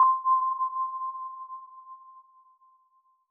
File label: Countdown end; Skylanders Cloud Patrol; Arcade, iOS, Mobile